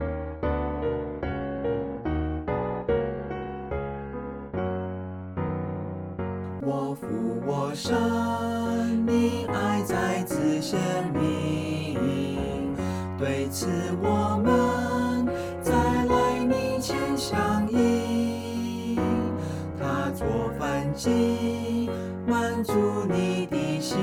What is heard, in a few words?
vocal; Hymns; Chinese